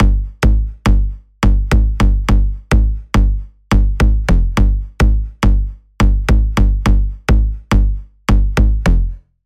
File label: Bass, Sylenth1; Routed Sound; Sylenth1 Expansion - Noble Gangster Vol.1